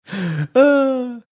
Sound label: funny sigh